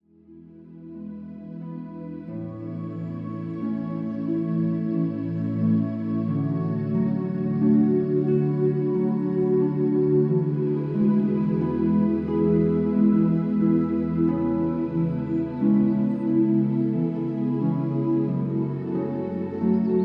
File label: Relaxing Music for Stress Relief. Calm Celtic Music for Meditation Healing Therapy Sleep